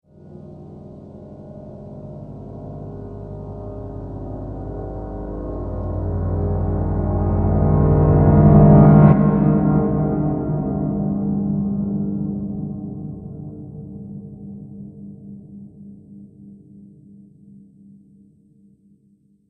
Riser Reverse Piano; Rise And Dive Sound Effects

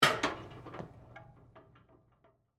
Medical Scale Off, Step; Emergency, Medical, Scale, Step, Off; Hospitals; Blast Wave

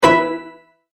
Multimedia Tone-Stop; Sound Design

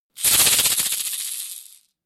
Caxixi, Caxixi fast shakes; Typical 'desert sound', similar to a rattle snake, a sound often used to illustrate dry desert; Shaker Sound Effects

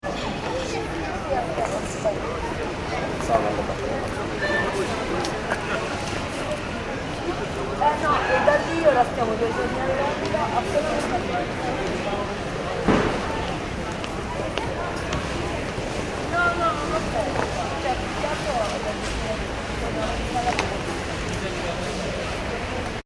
Airport Ambience (Arrivals); Airport Ambience - Arrivals Hall - London Stansted Airport; Airports; Backgrounds; McKinney Sound

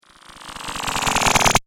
Cartoon Magic Spell

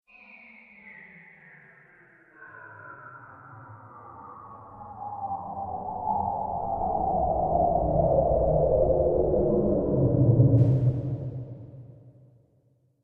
Teleport, science fiction

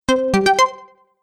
Stage Level Complete; Video Game Sound Effects

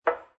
Billiard Pool Snooker Place Ball On Table; Pool ball, snooker ball, billiards ball: Place snooker ball onto table; Sports; LynneMusic

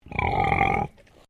Animal Pig Landrace Grunt

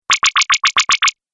Laser Repeating Pulse; Laser Sound

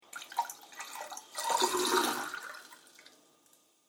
Water Fill Glass From Tap, kitchen; Kitchen Sound Effects

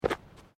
Footsteps Boots Ceramic Paving Tiles Step

